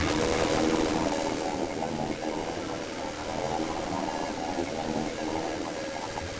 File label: charge; fowl-play; enemies; bob